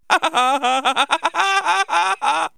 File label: laugh